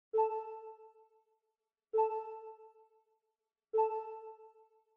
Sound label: low health; zelda